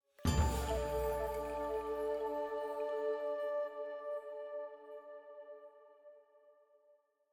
item Jingle Full + Choir Flute D

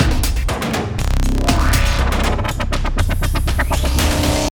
Taunt; Skyway

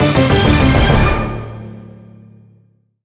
Big Boss Fanfare; Mario Party 9

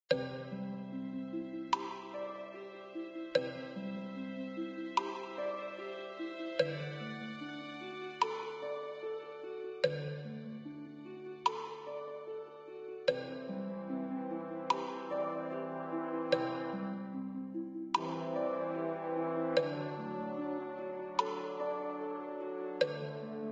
In Game Music (120 Second Countdown); Kahoot

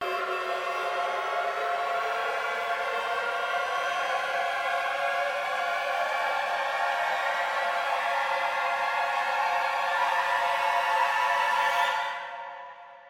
String Gliss

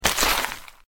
ice Break; granny chapter two; android; mobile

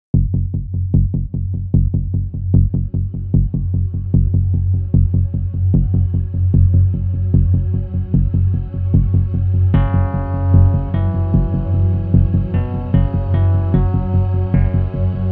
Pulsing Bass and Dark Electric; hunkenstein windows gamerip 2022

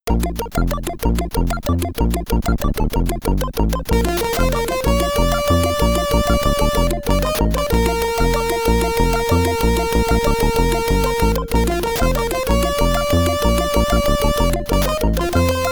SID Stereo; Heads or Tails; Adidas Championship Football; Commodore 64

